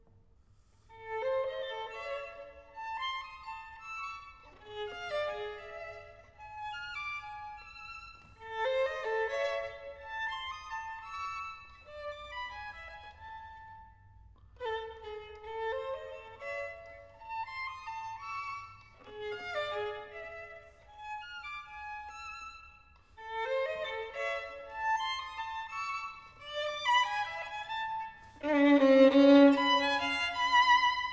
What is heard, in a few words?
Caprice Waltz, Virtuoso Violin